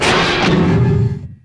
Scifi Forcefield Deploy; Energy & Forcefields

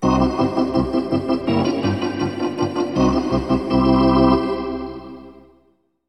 Hockey Organ

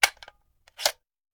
Machine Gun Foley; Sound Ideas; Guns

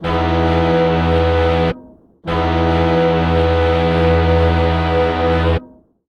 Hockey Goal Philadelphia; Hockey Horns & Buzzers

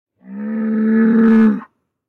animals cow; Blind Drive

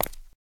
concrete; firefight

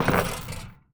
Street Ball Acrylic Basket; SFX Kit; Street Basketball

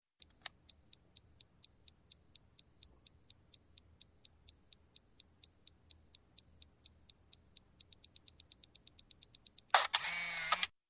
Camera 35mm Automatic Self Timer, Beeps, Shoots; Sound Ideas 2000 Series

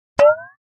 Frying Pan Hit Light, Going Up; Hollywood Edge; sound designer's toolkit v2

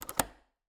Cabin, window, latch, flip, lock, close, wide; Eiravaein Works; Vaeyan IV

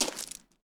gravel; firefight

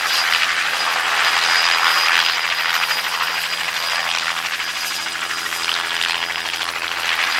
helicopter loop engine; Blind Drive

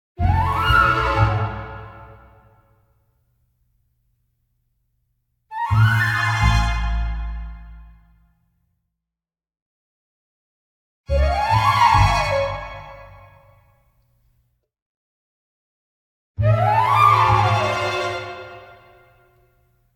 Orchestral Jingle Negative

